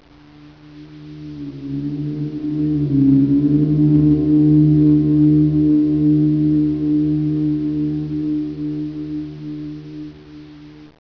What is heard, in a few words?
City; WARLOCKSNDS